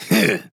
Grunt Attack; Viktor

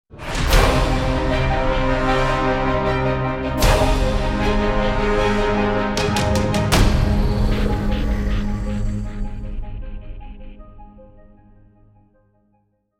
Music; Elemental Fury; radiant win

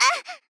Grunt Hit; Fairy

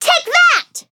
Weapon

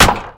attack; Brewmaster

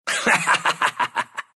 laugh, ru; Riki

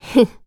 laugh, ko; blacksurvivaleternalreturn; Lidailin

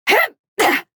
Grunt Jump; paladins; Kinessa

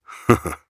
Laugh; Warrior; Paladins

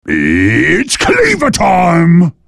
attack; Pudge

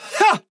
Grunt Attack

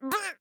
Death

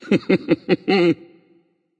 Wise Wizard laugh; Merlin

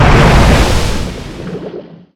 ghost ship impact

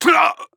Death

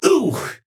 Grunt Hit; Khan; Paladins

